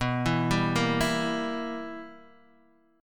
B Major 7th Suspended 4th Sharp 5th Guitar DADGAD, chord